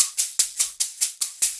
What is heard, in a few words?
SHAK, Percussion Loops; 150 COMBI